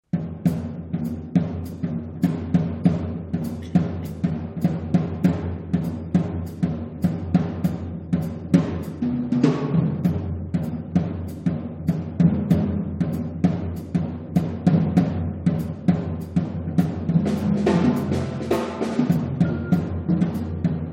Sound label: percussion section